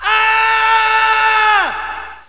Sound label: male yell